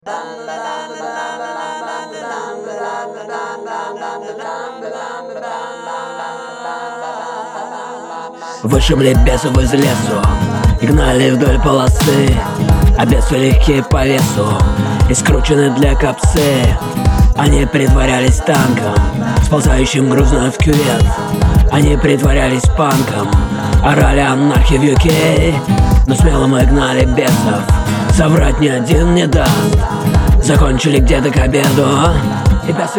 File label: chords